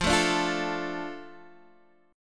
F 6th F down Guitar DADGAD

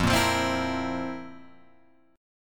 F Major 7th Suspended 4th, Guitar, Open F; FM7sus4 strummed; JGuitar

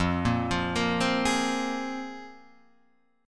C 7th Suspended 4th Sharp 5th

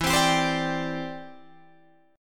E Minor 7th Sharp 5th, down, Guitar, Drop D

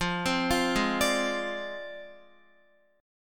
F Minor 6th F down Guitar; arpeggiated; tuning 4E,4A,5D,5G,5C,6F

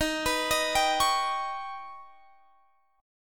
D sharp Augmented 7th D sharp, Guitar

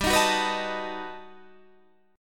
B 7th G; B7/G; strummed

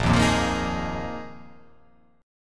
A sharp 7th Suspended 4th down

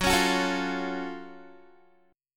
Gb Minor Major 7th Gb, down, Guitar; GbmM7 strummed; 4E,4A,5D,5G,5C,6F

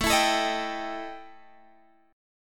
E Minor Major 7th Double Flat 5th A, guitar

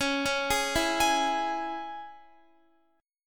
Db Minor, Guitar; arpeggiated

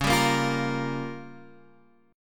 C sharp 6th Flat 5th C sharp down Guitar Drop D

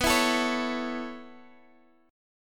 B 9th Suspended 4th B, Guitar, Half Step Down

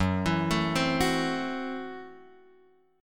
Gb Minor 7th Flat 5th Gb Guitar Open F; arpeggiated; x,1,4,4,3,1; JGuitar

